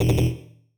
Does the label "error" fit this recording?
Yes